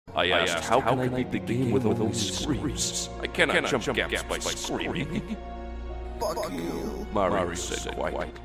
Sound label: screams; cave